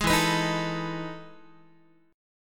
E Major 11th E, Guitar; strummed; 11,12,14,13,13,x

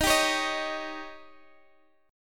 D sharp 7th D sharp; D#7; strummed

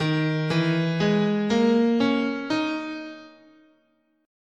Eb Traditional Japanese "in sen", scale

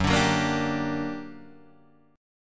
G Minor Major 7th, Gb, guitar chord

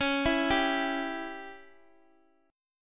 Db Diminished, Guitar Half Step Down

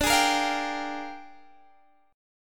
A sharp 13th

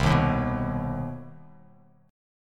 C Minor Major 7th down, Bass Standard, chord; strummed; JGuitar

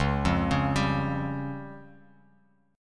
Db Major Flat 5th, Guitar Open F; 1,0,1,2,x,x; arpeggiated; chord shape

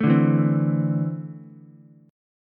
E 7th Suspended 4th Sharp 5th, Ukulele; E7sus4#5 strummed; JGuitar

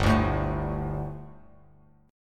G Suspended 2nd G, down, Bass Standard; Gsus2 strummed; JGuitar

